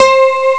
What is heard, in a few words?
atmosphere; Amiga Soundtracker Sample Packs